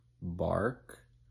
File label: bark